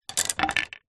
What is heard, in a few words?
foley wood spoons x2 drop down on wood surface; zapsplat